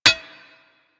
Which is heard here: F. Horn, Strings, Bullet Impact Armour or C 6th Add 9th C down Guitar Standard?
Bullet Impact Armour